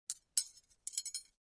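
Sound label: knitting sounds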